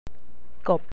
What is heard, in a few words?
frog